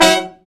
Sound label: BRA SECT; BRA SECTIONS